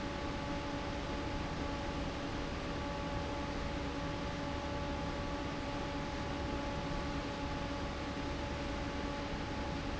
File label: fan